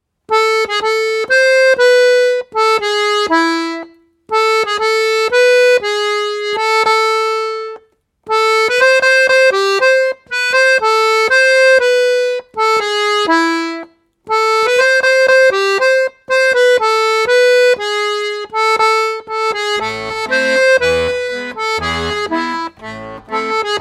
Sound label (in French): Air de accordéon; Air de Fualdès